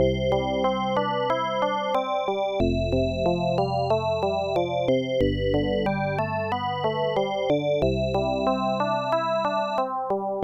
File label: ballad